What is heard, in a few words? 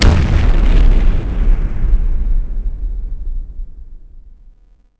boom; The Elder Scrolls III Morrowind GOTY; XBOX